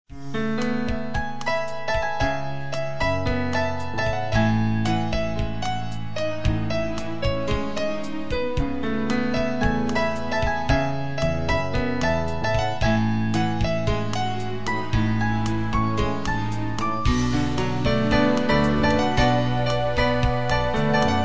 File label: newage